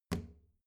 plastic trash can close soft

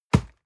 box hit hard dry

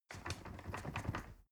ladder wood rattling

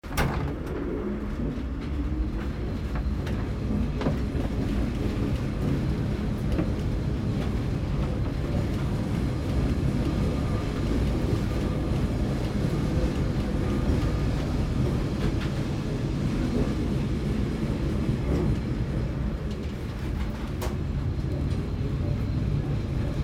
tram short ride

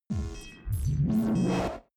space searching computer hard

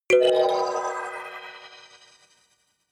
notification kalimba magical fourth

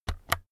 keyboard external key press slow

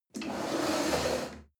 chair wood slide on floor medium

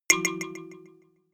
notification kalimba delay second

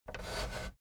fader plastic scrape single up halfway slow

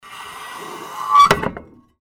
scratching

